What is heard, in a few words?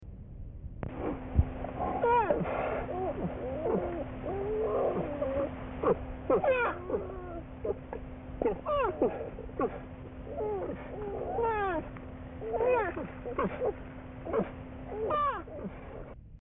grunts, bear sounds